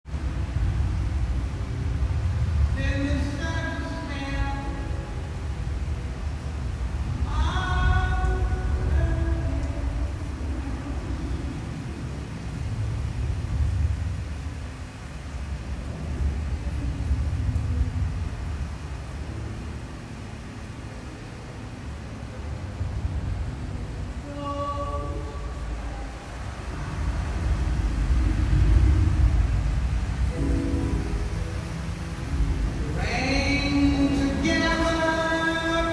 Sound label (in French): ambiance